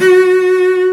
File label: CELLO F#4; AKAI S-Series CD-ROM Sound Library VOL-2